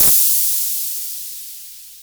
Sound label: JD800 Internal Drums, Roland JD800